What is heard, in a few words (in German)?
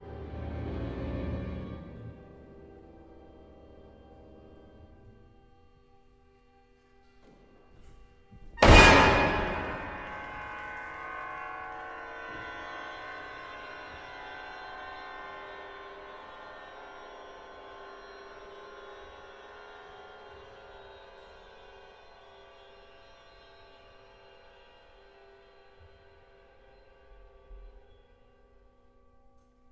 Hammerschlag; Cerha; Fasce